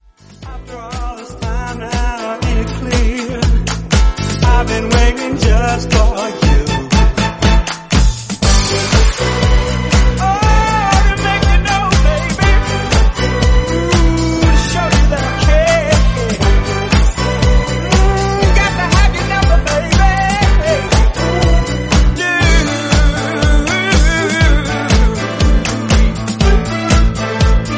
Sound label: Snippet 120bpm Em; 1976